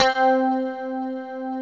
EL GUITAR C4

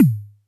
Toms, Elektron MachineDrum SPS1 MKII

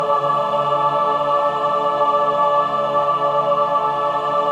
min chord, Symphony of Voices

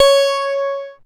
C#, PIANO; Big Fish Audio; Synclav FM

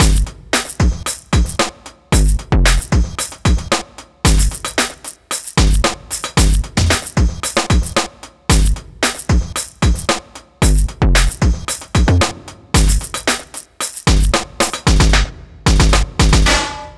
Beat, Drums, Eclectic Electronica

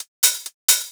Hi-Hat, Afterhours EDM

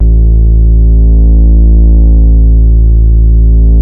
BASS STOP; Masterbits - Vintage Voltage; VV BASSES

